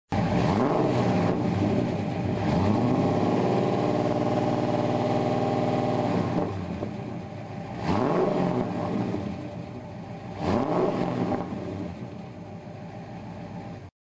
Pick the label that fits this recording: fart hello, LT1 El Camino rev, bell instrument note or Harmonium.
LT1 El Camino rev